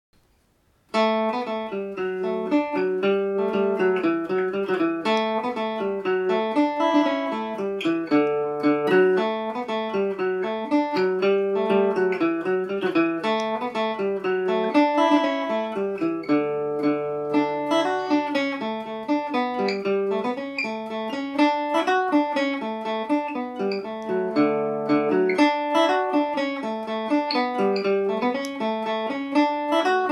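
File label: D major, irish mandolin; kerry cow revisited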